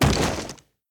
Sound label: Enemy Swat Paratrooper Landing